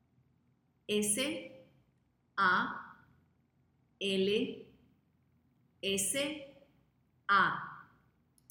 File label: salsa